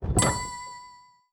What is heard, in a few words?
coin Wheel Sym Add; netent